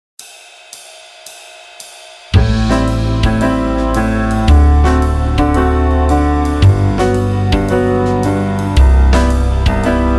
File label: Backing Track